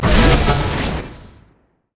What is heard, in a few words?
orb return; LoL; ahri skin04